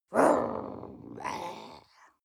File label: witch growl soft; kreaturen